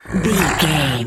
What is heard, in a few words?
zombie voice general emote